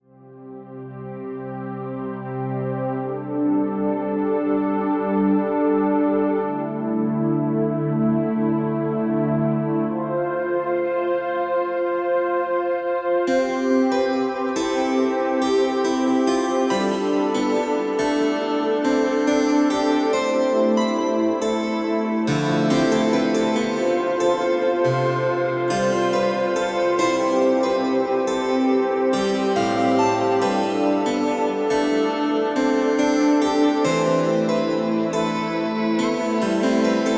harpsichord

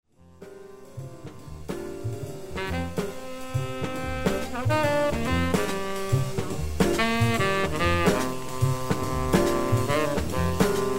spiritual jazz, dorian

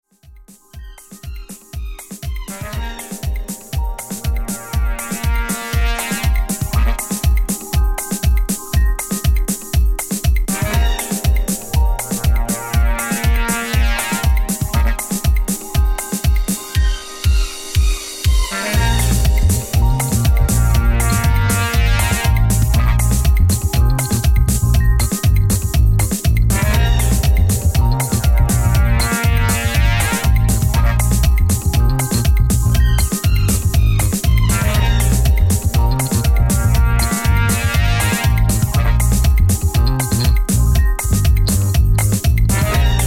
dub

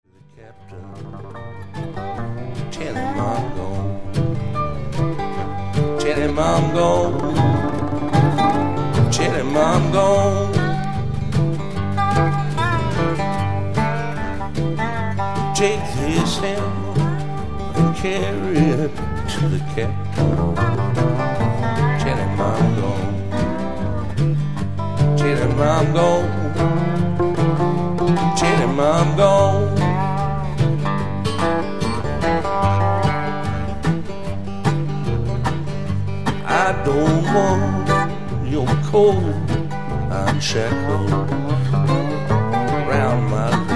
acoustic blues

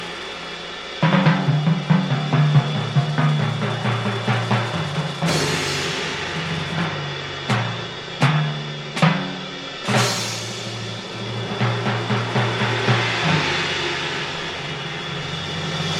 percussion spectrum

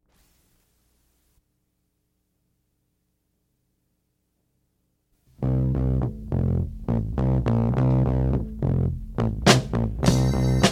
funky Korean soul

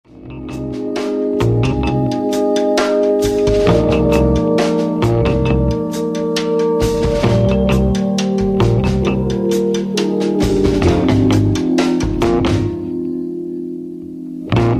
horn

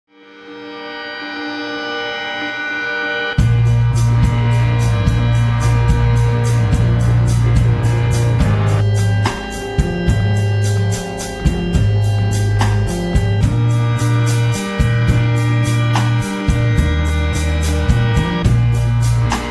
piano in bath

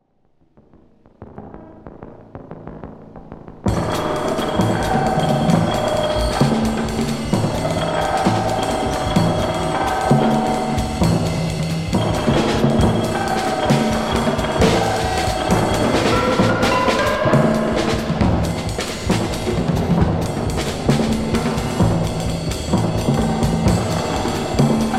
distorsion

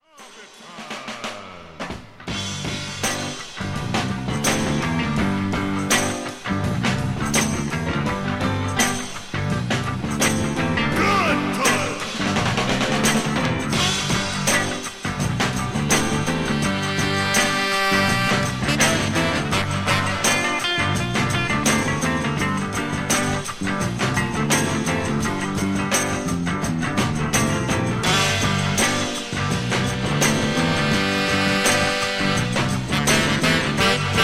soul drums

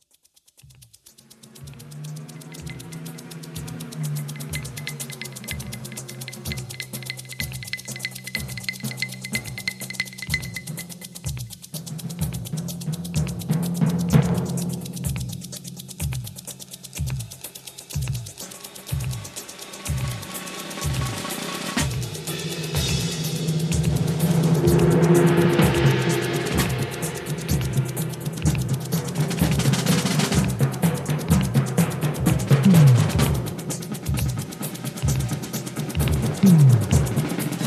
percussion; percussion spectrum

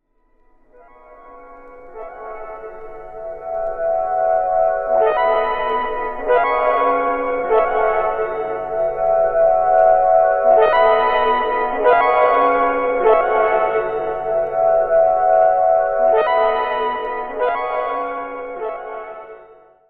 woo fanfare

